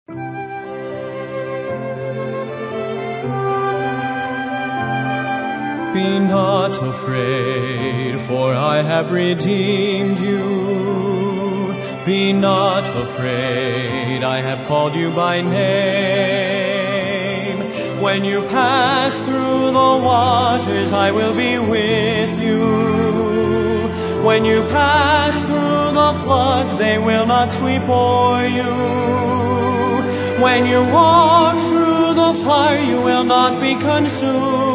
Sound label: Singers And Orchestra